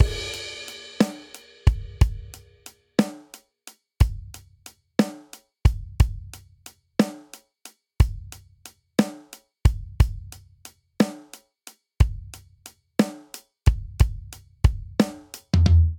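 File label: Swing Six RnB Verse 6/8 90 bpm, drums; Ableton; Factory Packs; Drum Booth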